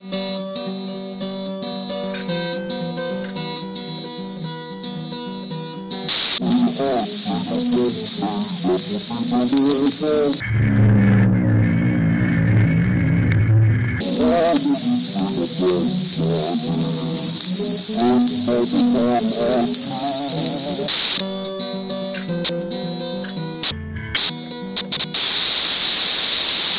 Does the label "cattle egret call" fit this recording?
No